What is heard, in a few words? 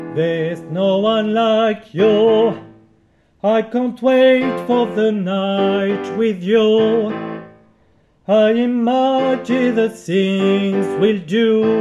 Refrain Soprano